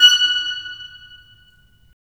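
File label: WND CL, wind clarinet; LA Composer vol. 3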